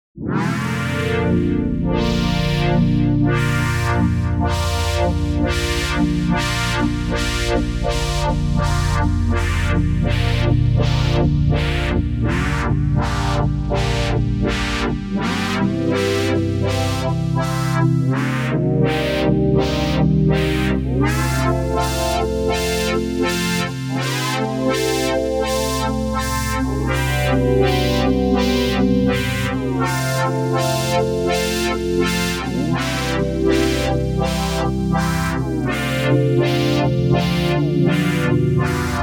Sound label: seq hold chord sequence